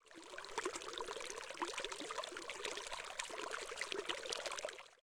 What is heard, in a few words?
water, liquid; minecraft; PixelPerfectionCE